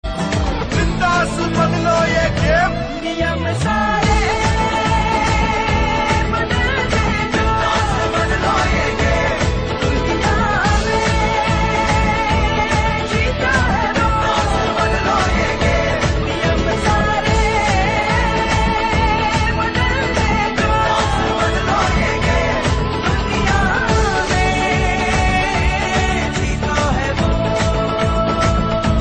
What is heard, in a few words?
pepsi song